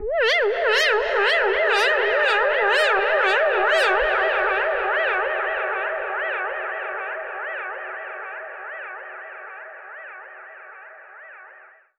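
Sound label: Modulation Proc, alarm siren samples, 120bpm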